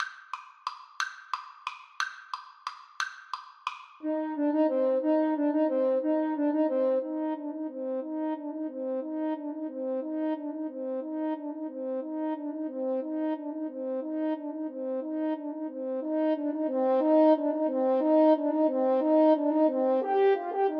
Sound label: carol of the bells HN duet, French Horn Duet